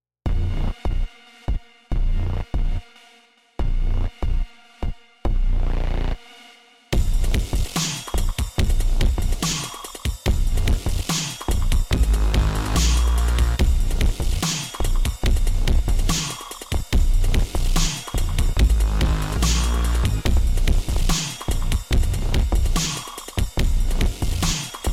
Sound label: Fx Drums Bass; XantaFe